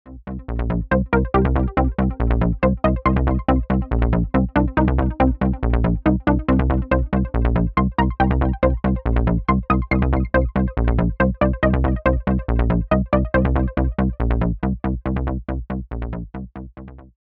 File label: Bassy; Harrison 32C